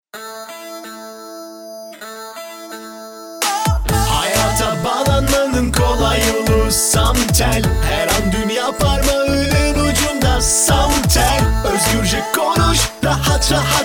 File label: jingle